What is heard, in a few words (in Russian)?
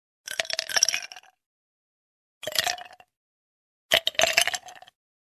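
звук льда в стакане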